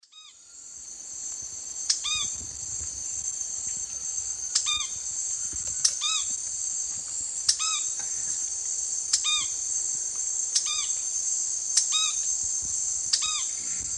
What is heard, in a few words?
Synallaxis rutilans, song; S. America; Eastern Lowlands